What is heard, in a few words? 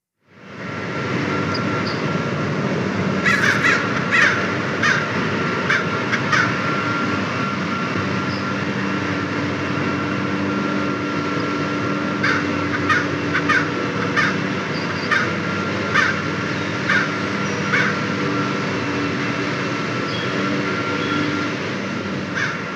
Crows with leafblowers